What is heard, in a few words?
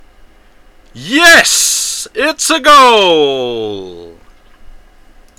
Goal